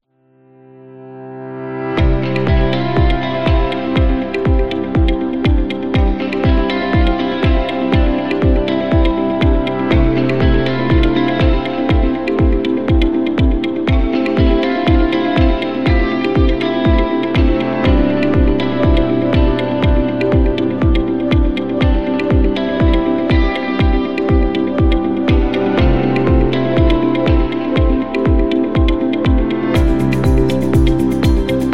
Corporate Trend, corporate music; corporate music vol 7

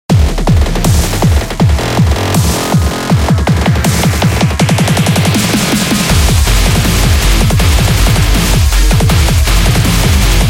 Rock Dubstep; electro rock

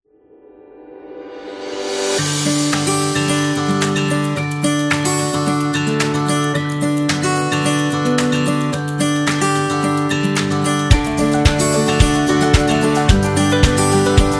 Light Rock

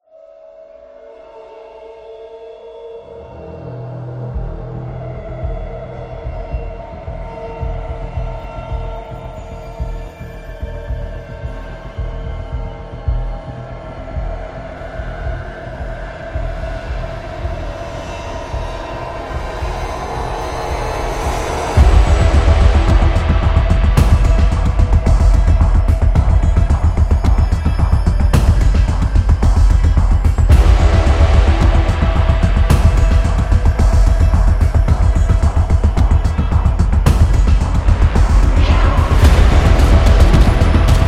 A Suspense

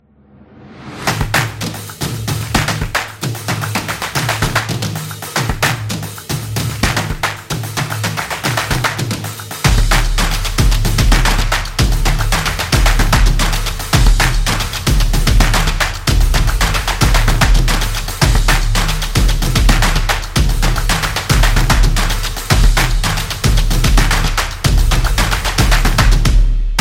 Taekwondo, sport drums

